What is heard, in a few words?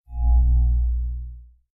hover sound